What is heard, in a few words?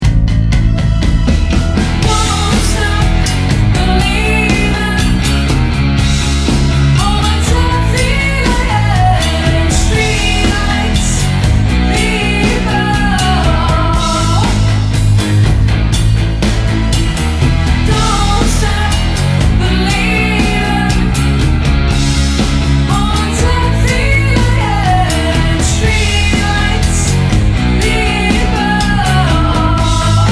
Rock Pop Band; Staffs